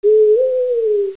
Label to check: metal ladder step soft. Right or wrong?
Wrong